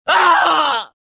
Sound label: death; Star Wars Jedi Knight II Jedi Outcast; XBOX; bespincop